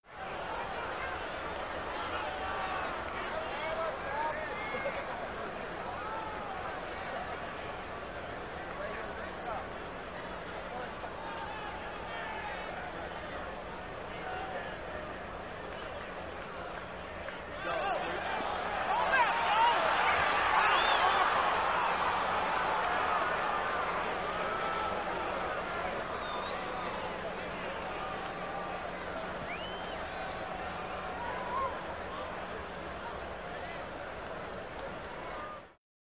Arena Crowd; Crowd, Steady Idle And Reaction 'ohhs'.